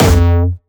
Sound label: F2, Jumpstyle Kick; Bassdrums; Jumpstyle Multis; vengeance essential club sounds-3; VEC3 Bassdrums